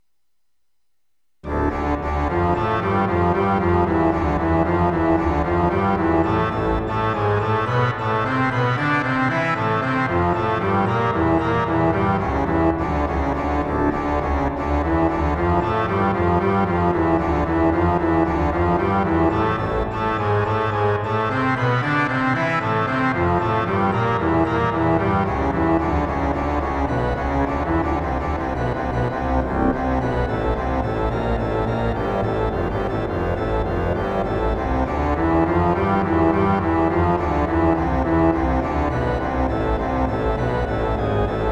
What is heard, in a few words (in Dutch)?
Pedaal Solo; Haarlem; Maestare-variaties 7